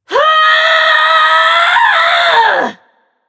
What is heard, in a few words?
battlecry; smite; mulan